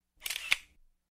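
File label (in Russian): звук фотоаппарата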